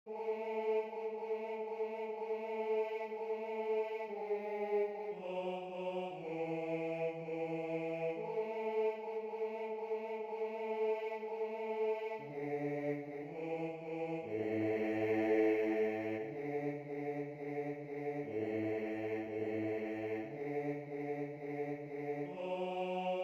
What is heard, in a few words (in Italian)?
Basso